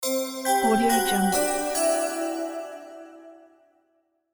bell mystery

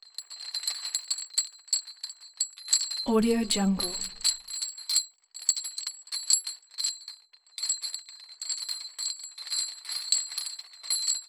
pitched just chains rattling; soundbits-soundfx

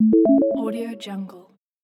notification

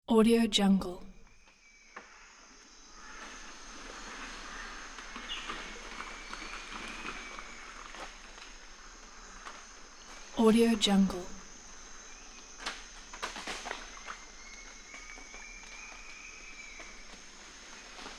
day jungle ambience

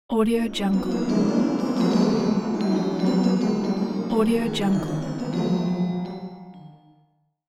orchestral magic surreal; vacating

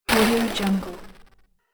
game break fizz

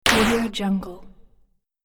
exploding game hit

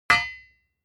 foley tool hammer hit steel; smartsoundfx

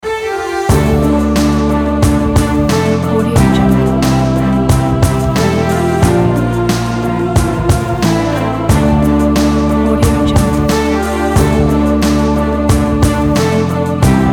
breaking news loop

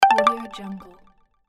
futuristic harp alert

